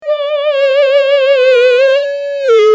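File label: shakuhachi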